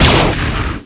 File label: laser; quakeworld; quakeforge; fortress; weapons